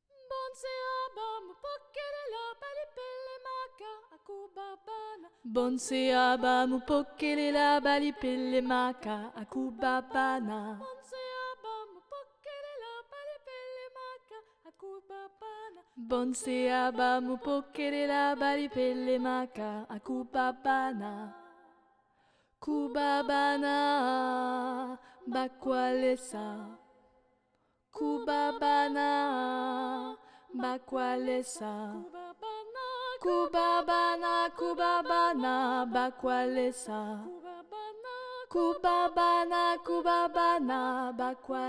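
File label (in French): basse; Bonse aba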